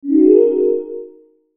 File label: Logo Swell Soft Pad; Sonic Logo Sound Effects; Production Element Imaging Element Accent Transition